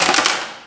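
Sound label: physics clipboard impact medium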